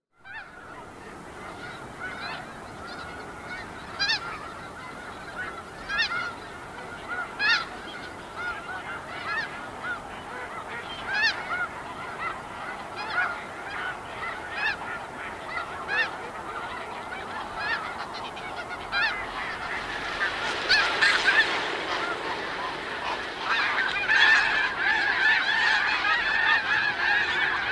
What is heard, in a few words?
Snow Goose Anser caerulescens; waterfowl